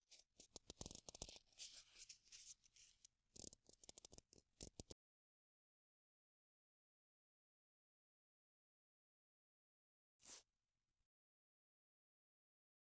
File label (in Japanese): (左)綿棒 耳のふち、耳たぶをサッサとする音; 耳かき音部位分けVer.